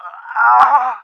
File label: death; action-quake2; male